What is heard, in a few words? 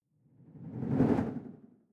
whoosh simple flutter phase low